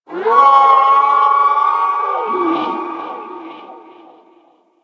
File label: Snow Critter Lure Call Success; Warframe; Hunting Critters